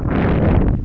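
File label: Missile; SGI Developer Toolbox 6.1; flight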